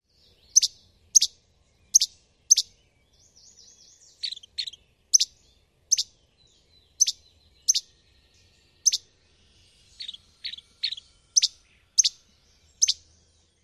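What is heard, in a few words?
Least Flycatcher, songs and calls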